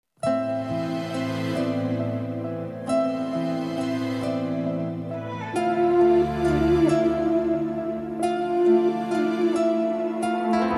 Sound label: instrumental; persian